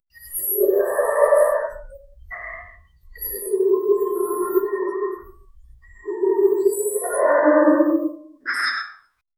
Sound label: small magical fairy purr, friendly